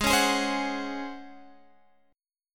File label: C sharp Major 7th Suspended 4th G sharp